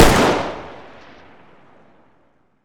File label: m24 distance fire, weapons